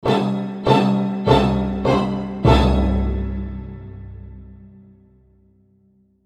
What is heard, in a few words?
Orchestral Phrase; FXHome